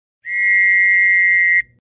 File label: Trench whistle